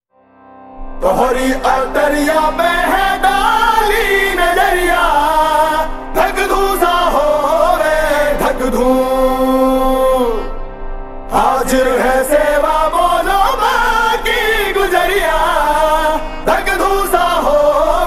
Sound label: Slowed Reverb